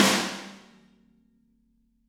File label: Snare Crack